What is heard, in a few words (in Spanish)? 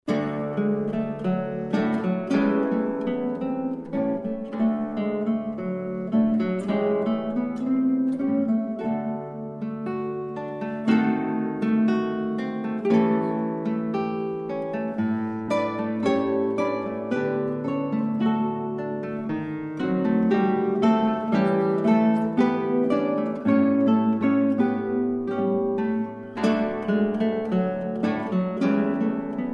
bajo